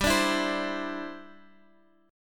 G 6th Suspended 4th G; strummed

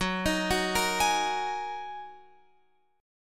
F sharp 6th Add 9th F sharp down Guitar Open F; F#6add9; arpeggiated

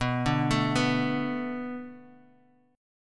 B Minor 7th B, Guitar; Bm7 arpeggiated

